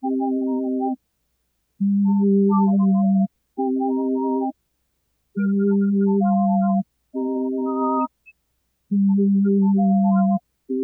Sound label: Melody Loop 135 BPM C Min Organ; Loop Stems; Cymatics; 2020 Melody Collection; Various Melody Loop 5